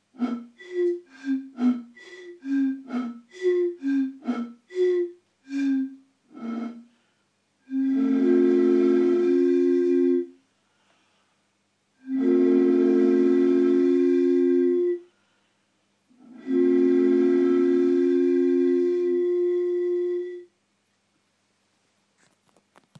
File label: making music even with glass bottles